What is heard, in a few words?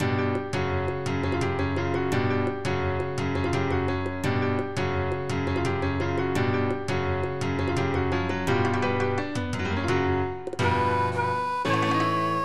sevillanas 170, flamenco sounds